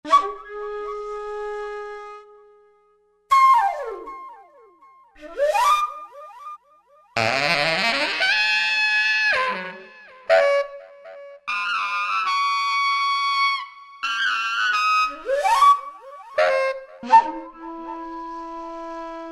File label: wind fx; Dance; Roland SRX-05 Supreme Dance